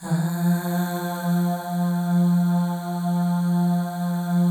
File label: AHS, Symphony of Voices, FEM